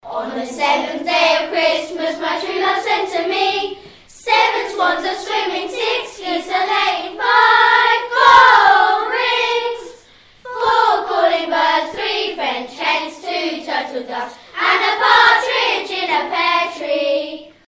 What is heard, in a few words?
sing 7th day of xmas; martlesham